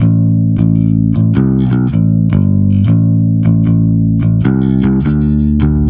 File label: bass brutal, Loops, Phonky